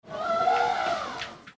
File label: trumpet